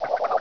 Dice Flip; PC; MARIOSFUNDAMENTALS

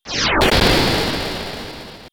thunder; mallow; SNES; SMRPG

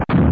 door close; N64; YOSHISISLAND

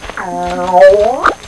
swoopin groan; GAMECUBE; SUPERMARIOSUNSHINE

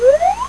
zoom in; GAMECUBE; SUPERMARIOSUNSHINE

